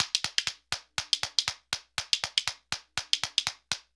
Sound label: 120, African Contemporary; Spectrasonics; Supreme Beats; Bash Novel